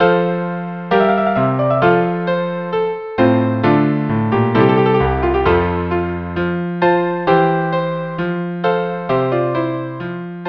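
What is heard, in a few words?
quartet; Telemann Helden 7 La Vigilance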